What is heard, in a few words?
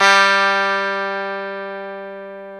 GTR E12, Dual Guitar; Club-50 - Foundations Roland